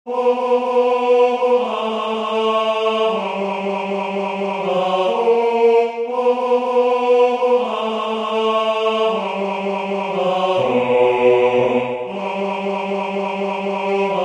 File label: bass